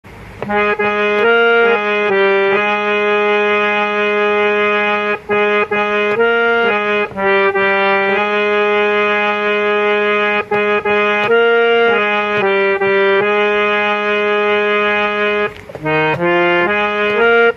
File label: navkar mantra harmonium ringtone; णमकर मतर; jainbhajan